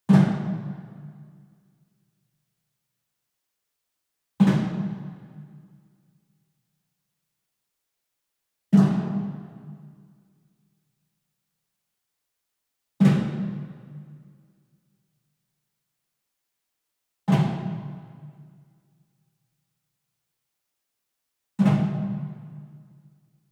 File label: WOOD PERCUSSION Wood Drum Mounted Flam Slap Far; boom library; Cinematic Strikes Construction Kit